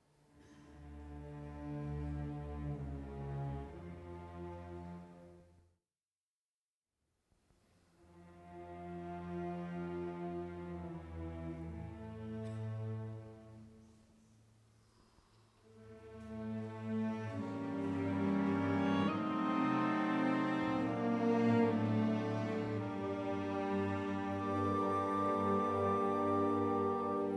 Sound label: Symphony in D Minor, Orchestra; Franck; 1st Mov; paducah symphony orchestra